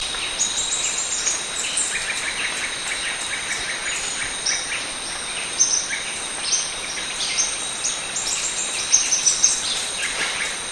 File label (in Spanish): saira dorada, Hemithraupis guira, Guira Tanager